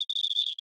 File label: Env Void Cicada 3 Singles